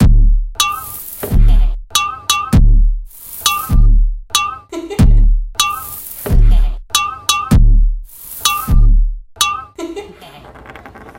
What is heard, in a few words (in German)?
Kling Klang